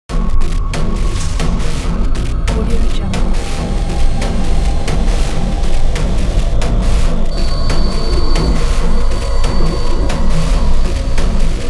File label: horror evil